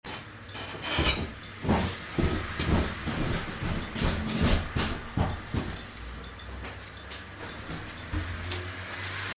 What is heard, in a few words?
creak and steps